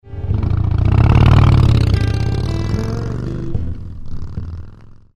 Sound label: small harley